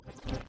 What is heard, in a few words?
Timer Restart Rev